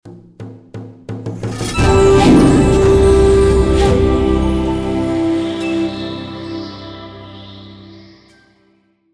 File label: bonus Prize you Won Reveal bear; Shamans Dream 2